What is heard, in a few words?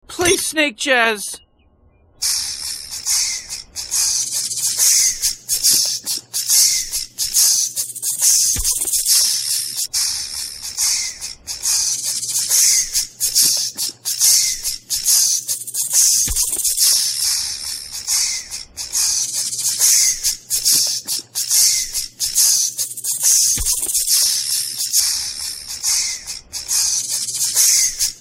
snake jazz